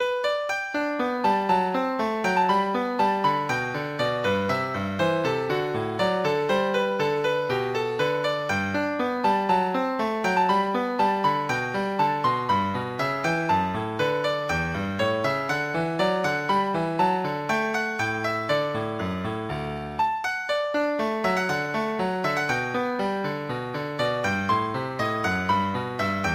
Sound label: bourree, piano; french suite 5